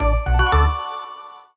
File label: win; Amatic; Fortune Girl